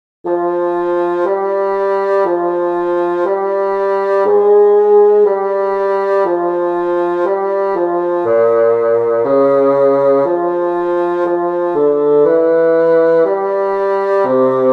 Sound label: Summertime Bass; Rehearsal Tracks